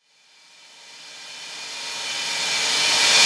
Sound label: Reverse Cym, Cymbals